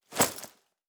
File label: Bag; Survival Game Sounds - Lite Edition; Objects and Items